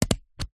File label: stapler pocket sized single staple card; office